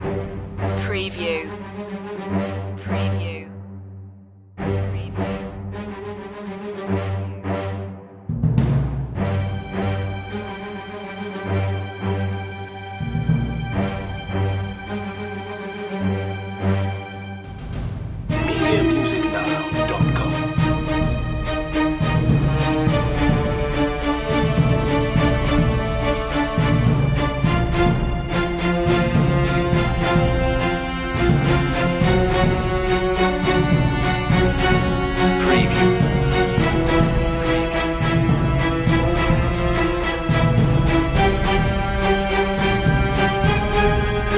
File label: action adventure drama